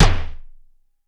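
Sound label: GATE TOM; Crypton - DrumBase5000; GATE KITS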